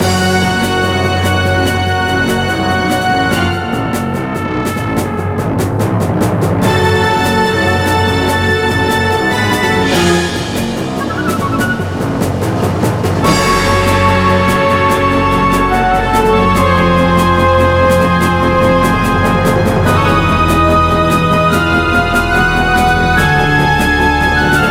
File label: big battle music; Pacificwar